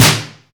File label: dirty steel snare drum sound d sharp key